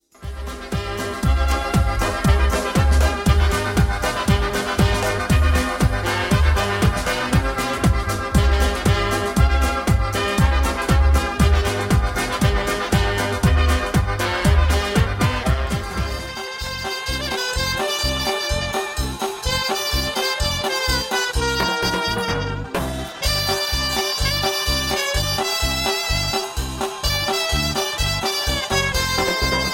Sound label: polka band medley